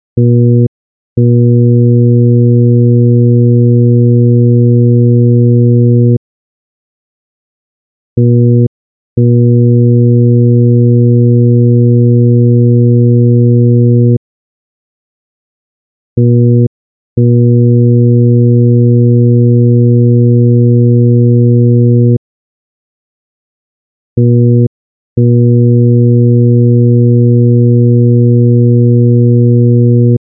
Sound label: 120Hz